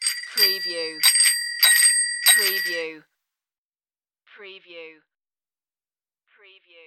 Bell Bicycle Standard

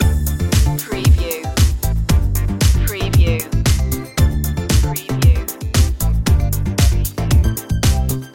house chilling loop

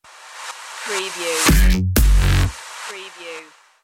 phunky beat stinger

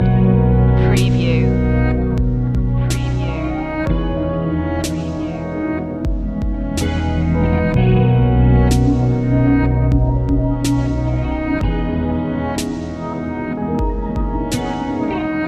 deep technology loop

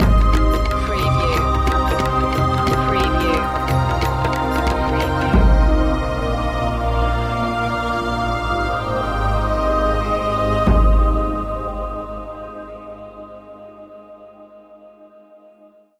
fantasy stinger

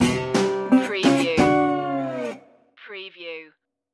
blues stinger

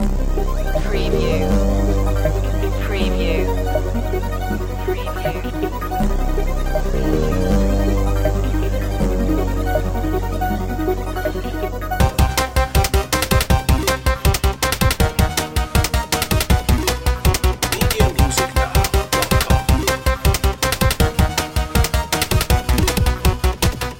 retro video game countdown to zero loop

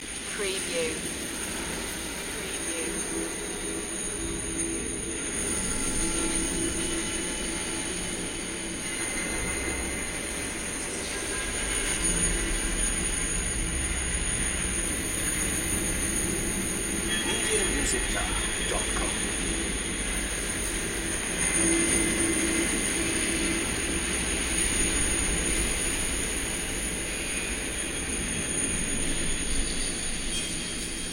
washy dimension ambience loop

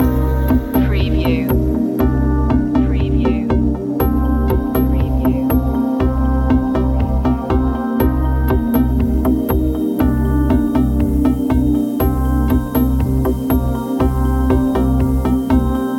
downtempo sunset loop

